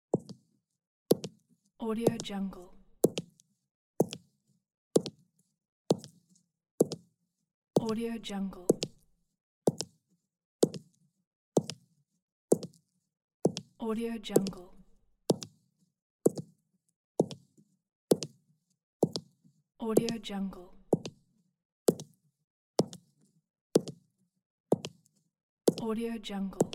footsteps outdoor

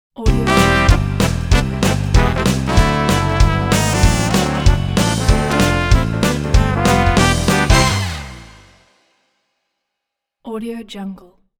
show intro logo

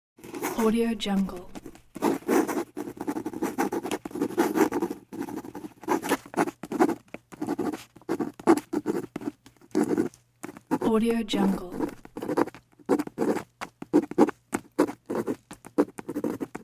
pen

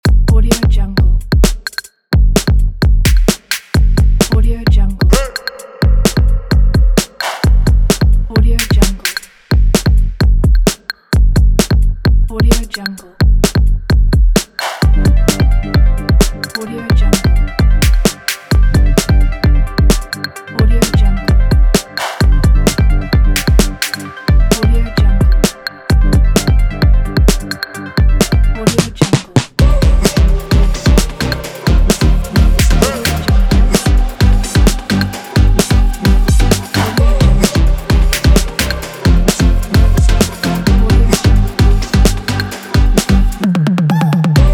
commercial upbeat

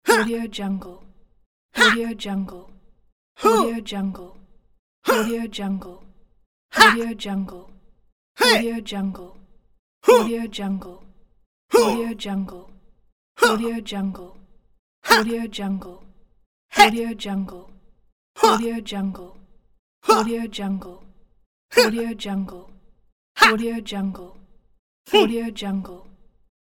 young male grunts pack

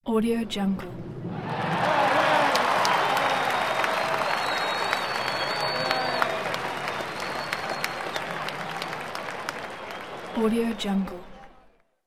ovation from fans

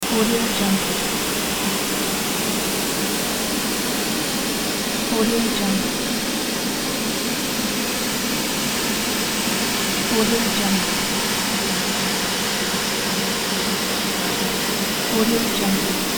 metaverse noise drone

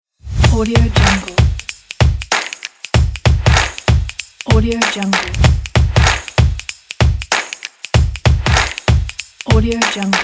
stomp and claps groove logo

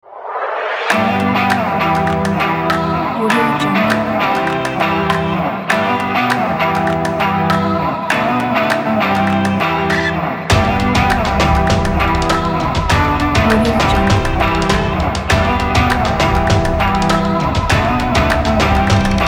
opener pop logo

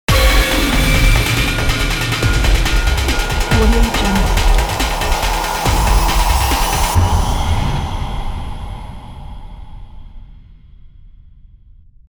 suspense dark horror riser logo

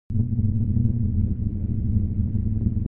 sci-fi space background noise quiet

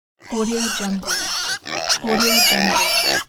baby pig grunts

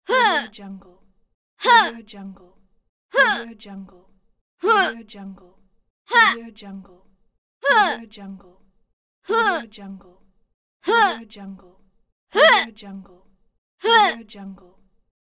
girl short grunt

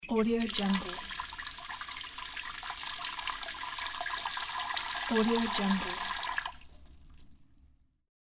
water sink kitchen; medusamusiclab

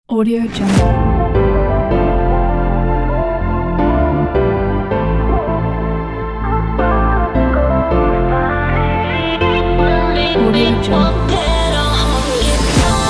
future bass

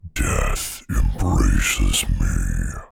game character demon pain death embraces me

